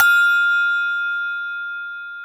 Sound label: BELL F, TOY HANDBELL; AKAI S-Series CD-ROM Sound Library VOL-7